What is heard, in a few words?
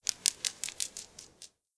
hopper fly; Left 4 Dead 2; random amb sounds